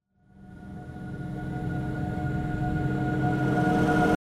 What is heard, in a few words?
Horror Accent Rise, Short